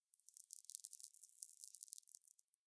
TV Screen Static; SoundsCrate

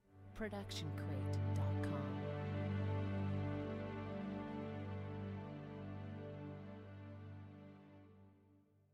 feel good strings short chord; soundscrate

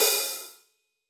hi hat 14 inch slightly open; soundscrate

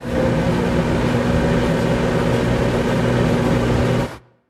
robotic machine short

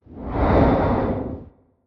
airy whoosh passing

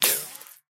bullet impact ricochet

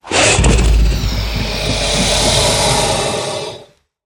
crocodile hiss; soundscrate

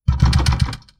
wood door pound other side 2 times

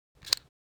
switch clicks; soundscrate

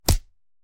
Fight Impact; SoundsCrate

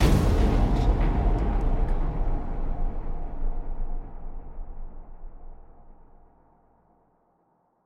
trailer hit distorted; soundscrate

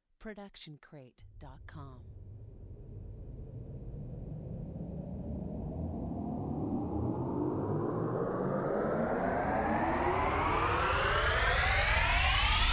Cinematic Riser

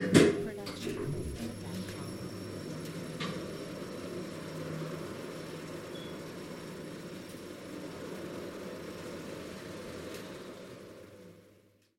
elevator multi floor wide; soundscrate

